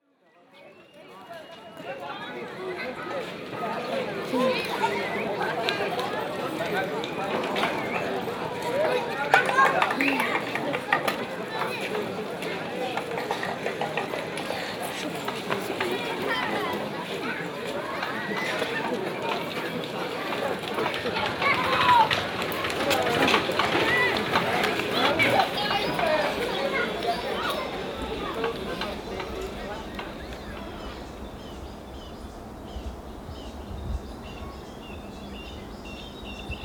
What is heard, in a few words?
soundscape